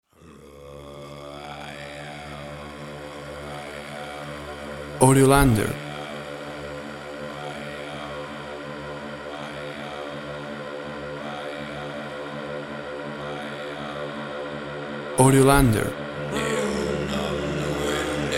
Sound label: Vocal Mood Soundscape